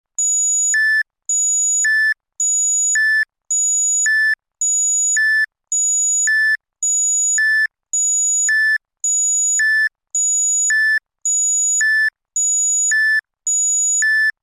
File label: Warning alarm beeps